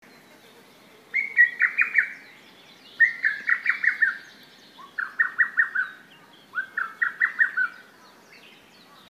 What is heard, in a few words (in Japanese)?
hototogisu; Bird